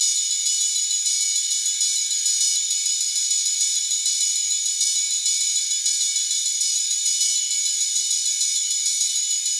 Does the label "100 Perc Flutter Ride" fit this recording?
Yes